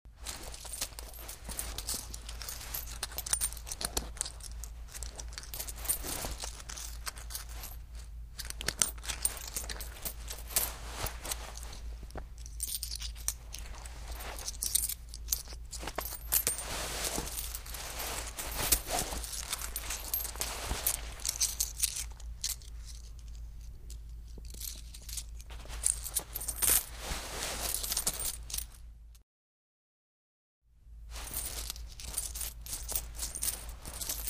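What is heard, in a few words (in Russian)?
копошатся в кармане джинс звон монет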